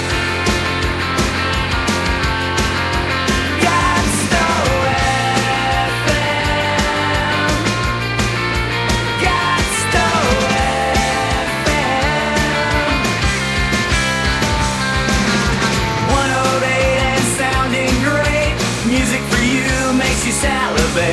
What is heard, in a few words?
JINGLE Indie